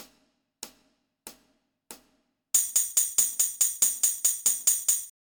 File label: Eighth Note Trips with Countoff and Click